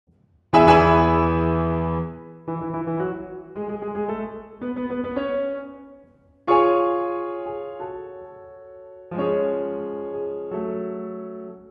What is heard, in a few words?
Solo Piano; Barber of Seville Overture; Ganz